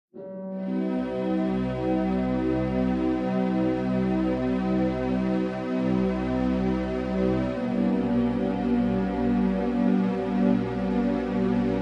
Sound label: Ambient